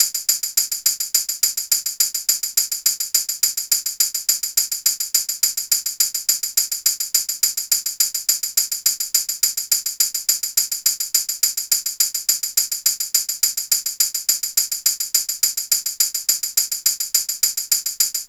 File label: hat 105, Hat Loops; Drum Loops; SD MIDNIGHT Sample Pack; Slate